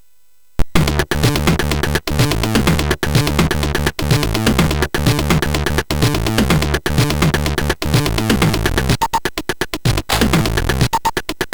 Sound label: Funk, sid, MOS6581R3